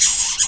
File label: shock idle, shockroach; svencoop